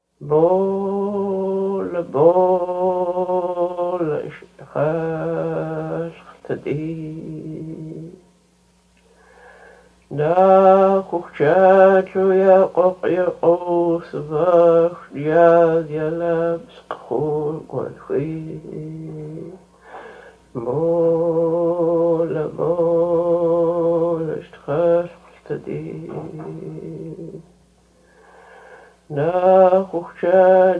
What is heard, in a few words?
Dirty Face Song